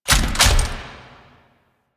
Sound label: Weapon Pickup; Warframe; Veilbreaker; Devourer Kahl